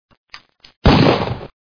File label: gunshot